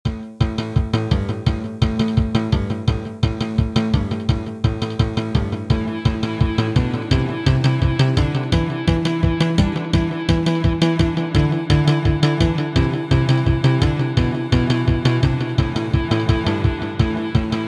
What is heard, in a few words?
tense drive